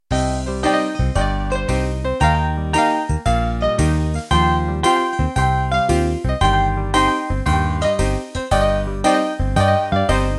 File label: Country Ballad End